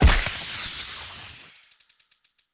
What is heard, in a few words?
wugong